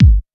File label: kick dance, techno trance drums